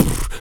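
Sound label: vox percussion, Vocal Planet Jazz FX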